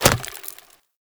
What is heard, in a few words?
Bow Arrow Impact Vegetation; Weapon Impacts; Player; Bows; Hunter Bow; Environment; Envoy Hunter Bow